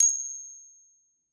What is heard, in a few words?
grab gem; pirates